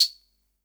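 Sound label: SR95 tambourine; Best Service; Dance Mega Drums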